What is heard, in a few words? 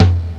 tom; MATLAB